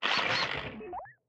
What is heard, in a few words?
robot repair; factorio 2.0.55